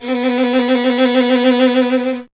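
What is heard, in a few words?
STR violin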